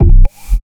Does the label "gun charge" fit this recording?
No